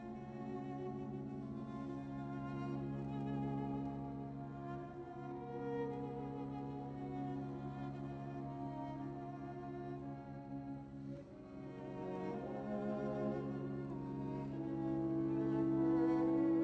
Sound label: classical